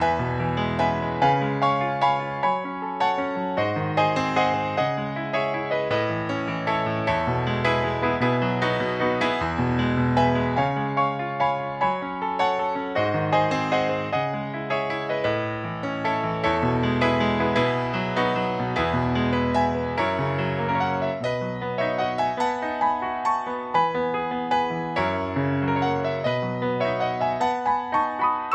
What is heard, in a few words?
Classical Song; Movements 1 and 2